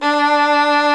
STR VLN, Str Quartet, Strings

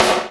SNR, Snares; AMG; Now CD-ROM (Roland); NOW! Drums

